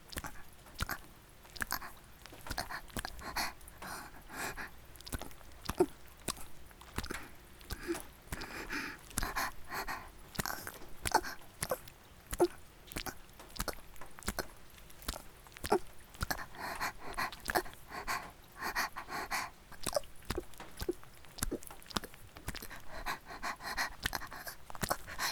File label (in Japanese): 雨降りバージョン ギシアンループ; 無感情ダウナーヤンデレ少女に弱みを握られて…